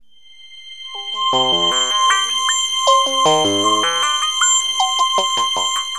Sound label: SYNTH D+B 160 C, Drum+Bass, Keys; eJay